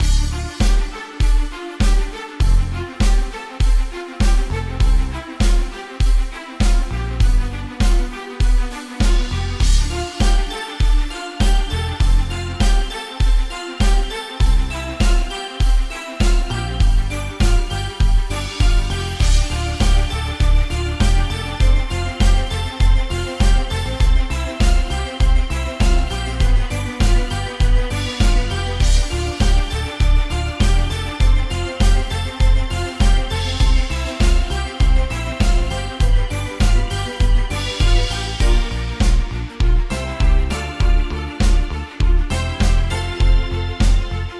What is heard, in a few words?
free spin melody; Fire Queen